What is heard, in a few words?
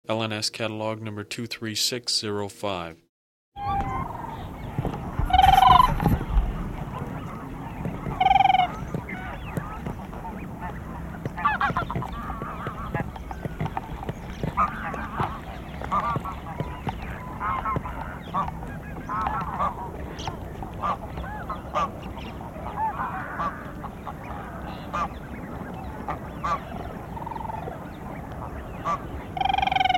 siberian crane